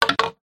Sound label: ball bounce, roulette